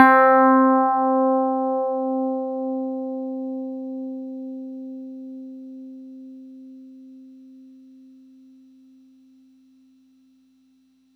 FM Tine c4, Yamaha YX81Z

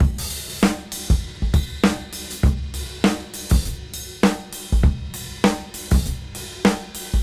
Swing 98 BPM; AMG; Keith LeBlanc Phat Butt Jams

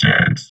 VOX VOX, Electro Vox

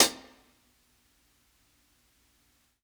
60s HH SOFT, HI HATS; Basement drums vol 2

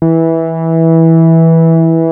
Prophet 5 E4, Synthesizer; Hollywood Edge - Giorgio Moroder Rare Synthesizer Collection

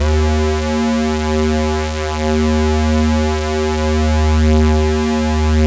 SAW, Synth and Organ

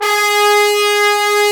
BRS TBNS, Bone Sec; Roland L-CD702; Bone Sec.FX

